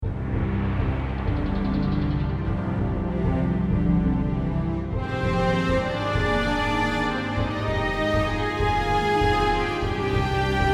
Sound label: new alert; Galactic Civilizations II